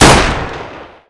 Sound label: glock18, weapons; Counter-Strike Global Offensive